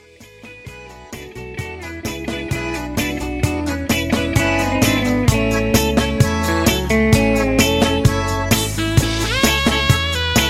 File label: Instrumental With Melody